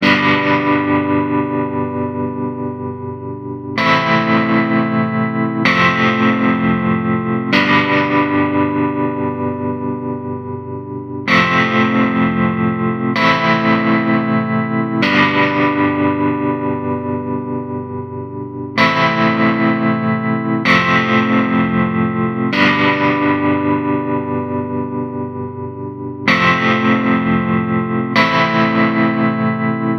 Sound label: guitar